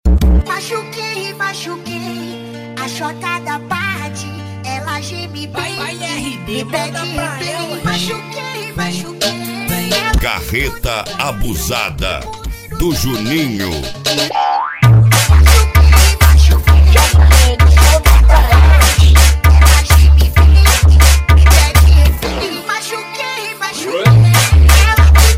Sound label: mega bass